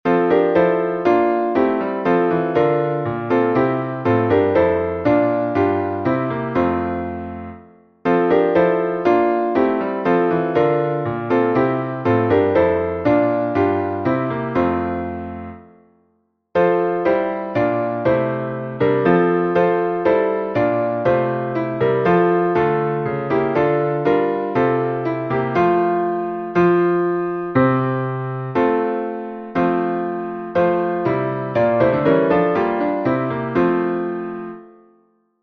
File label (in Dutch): instrumentaal; Drievoudige groet